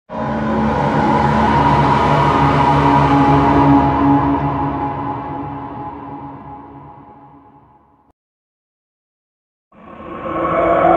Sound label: horror scary sound creepy robot sound